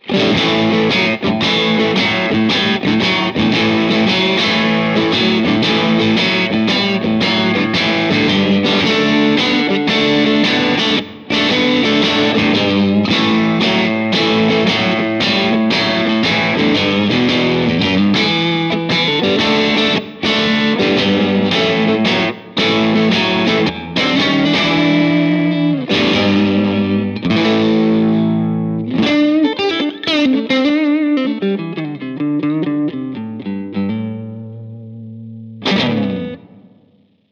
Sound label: Brit Top Boost; Torpedo Captor X SE; Pete Thorn